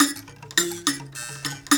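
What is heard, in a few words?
PLK Bass Mbira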